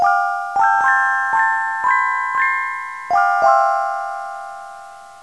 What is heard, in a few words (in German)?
Glocken